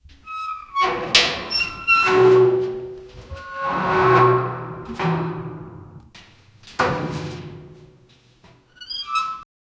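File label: heavy rusty metal door creak, shut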